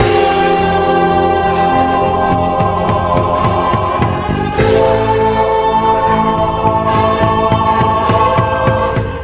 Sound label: guitar